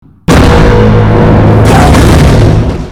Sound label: siesmic torpedo